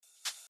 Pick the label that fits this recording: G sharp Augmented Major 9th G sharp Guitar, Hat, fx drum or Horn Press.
Hat